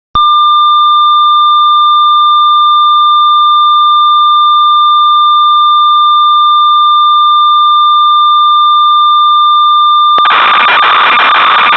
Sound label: ob6, synth; Oberheim; Factory Patches